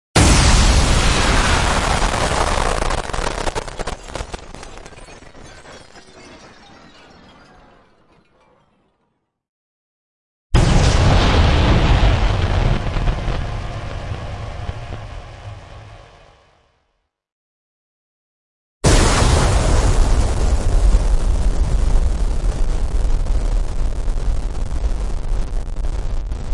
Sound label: Explosions (Distorted); Epic Tools vol. 1